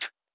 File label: Spit; Spitter